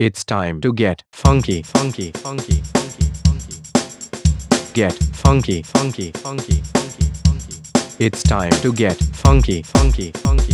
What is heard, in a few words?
Funky Breaks